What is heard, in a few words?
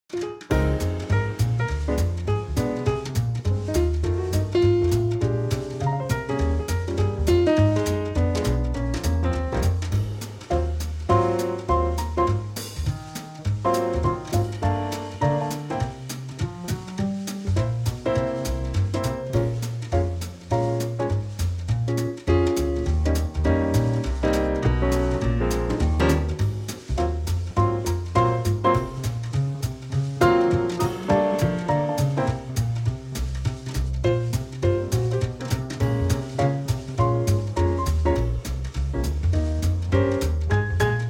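Ab, backing track; key - Ab - vocal range - Eb to F (optional Ab)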